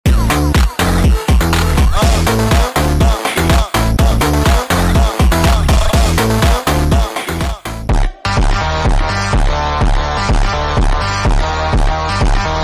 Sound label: phonk